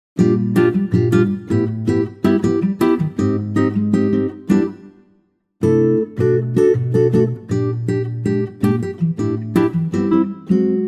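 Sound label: acoustic guitar G; key - G - vocal range - F to C; Lovely mellow acoustic guitar arrangement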